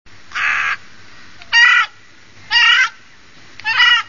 kraai

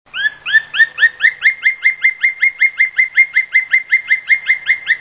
specht

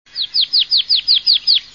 glanskop

